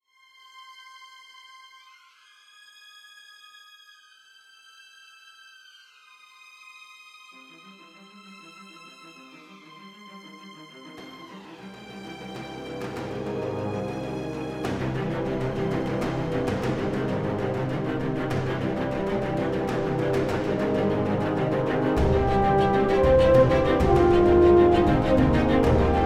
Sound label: Golden Dhol